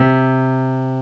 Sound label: Piano (C2); X-Wing Demo; X-Wing Patches